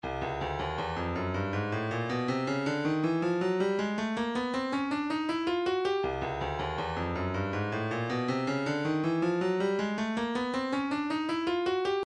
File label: 160 hihat